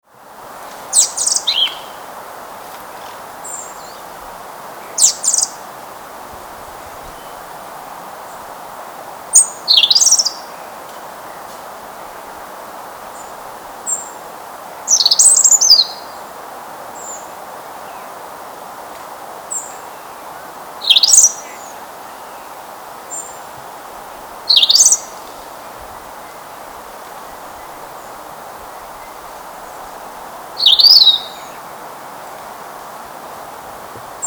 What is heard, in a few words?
Erithacus rubecula; SETE